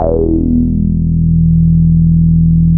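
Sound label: C3, major minor violin trill; INIS - Vintage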